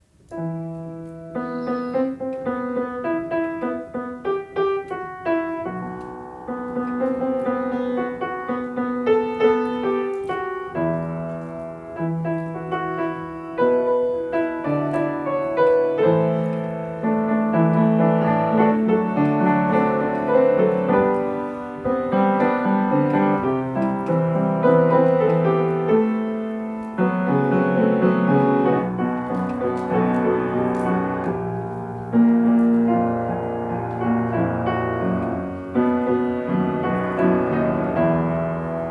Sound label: Piano